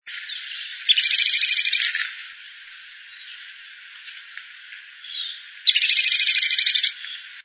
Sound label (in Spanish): carpintero bataraz